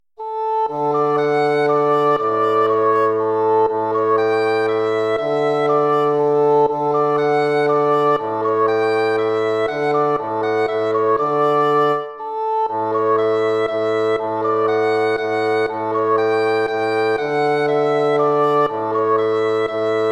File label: waltz; Carulli Ferdinando